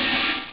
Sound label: hihat open; puznic; Amiga Soundtracker Sample Packs